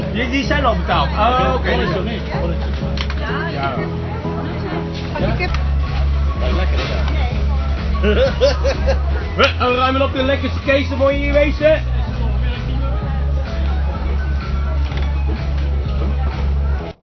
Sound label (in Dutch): markt; middelburg